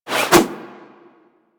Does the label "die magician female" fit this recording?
No